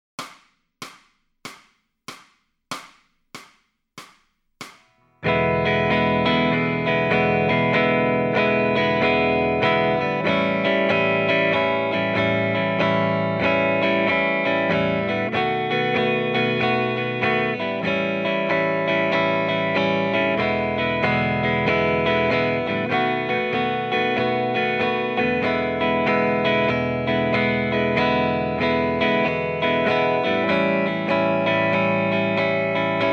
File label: Lead Backing Track, electric guitar